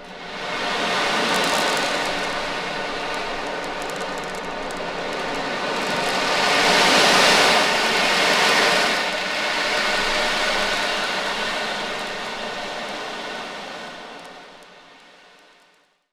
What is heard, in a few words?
ocean drum